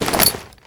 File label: Take Item; angry neighbor android gamerip 2017